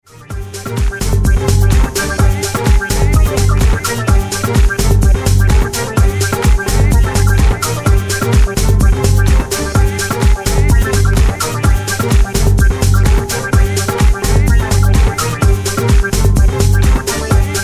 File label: Instrumental remix